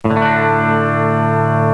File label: d major, chords